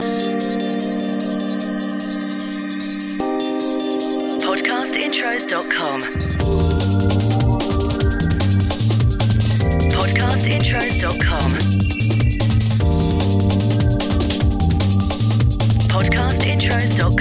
DRUM N BASS; Podcast Watermark

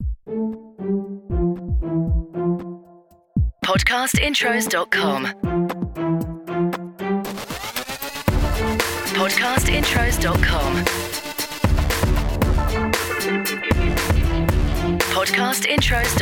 Podcast Watermark HIP HOP

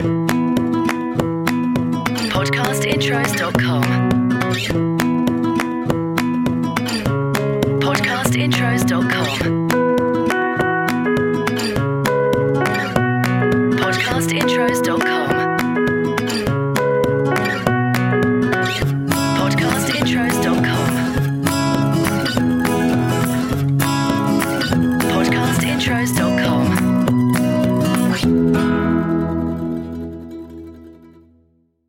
ACOUSTIC Lullaby; Podcast Watermark

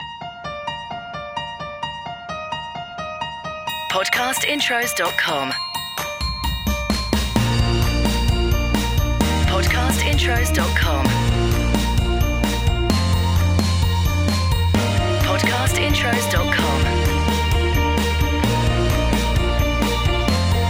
MOTIVATIONAL; Podcast Watermark

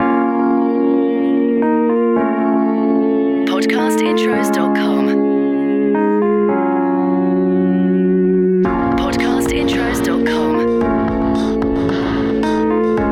BREAKBEAT; Podcast Watermark

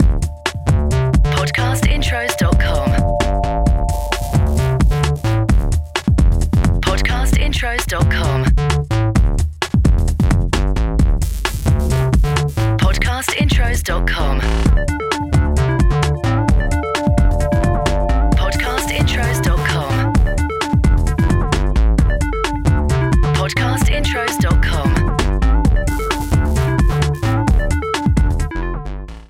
BREAKBEAT Loop; Podcast Watermark

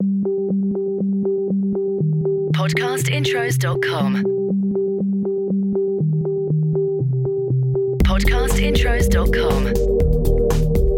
CHILL OUT; Podcast Watermark

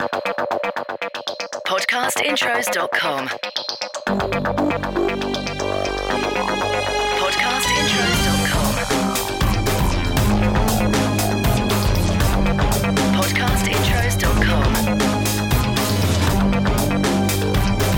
BREAKBEAT Hidden; Podcast Watermark

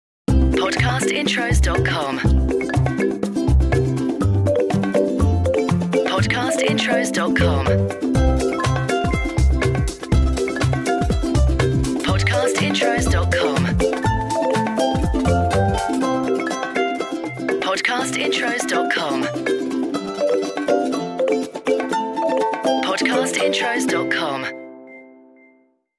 CHILDREN; Podcast Watermark

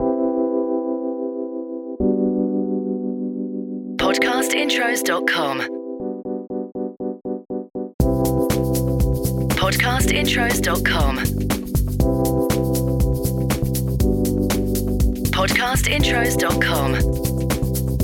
LOUNGE SWING Simple; Podcast Watermark

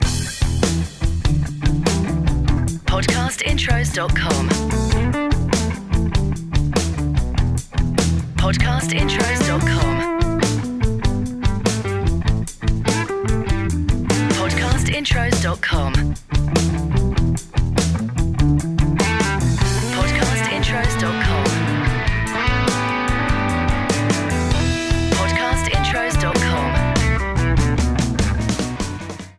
BLUES Club Blues; Podcast Watermark

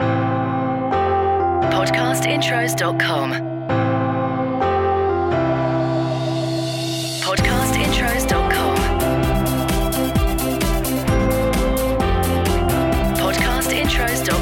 DANCE; Podcast Watermark

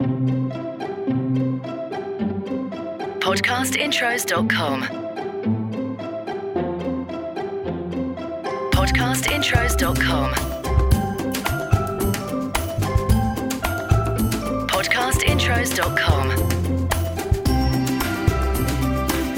CHILDREN; Podcast Watermark

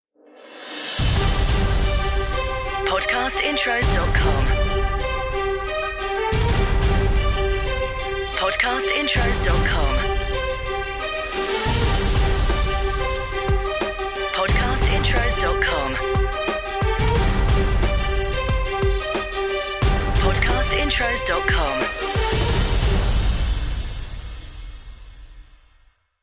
CORPORATE; Podcast Watermark

